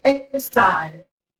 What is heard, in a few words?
feedback confirm